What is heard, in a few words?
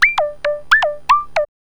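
synth blip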